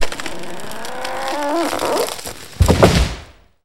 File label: Trees Falling; Tree Branch Bends, Cracks, And Splinters With Reverb. - Crash Sweetener